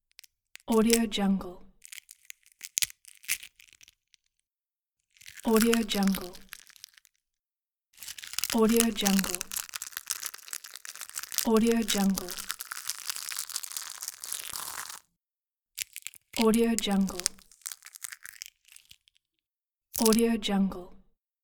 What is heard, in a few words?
shell crackle